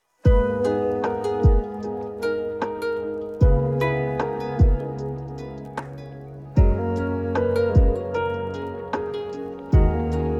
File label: instrumental; persian